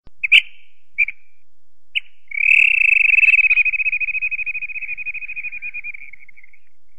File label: flycatcher bird; Pets And Animals Ringtones